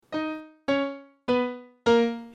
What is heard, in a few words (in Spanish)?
Cadencia en menor; Mezcla Ingles